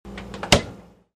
refrigetor door

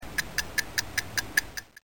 clock

